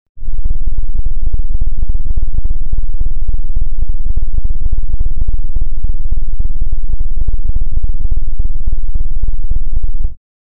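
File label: infrasound 7 Hz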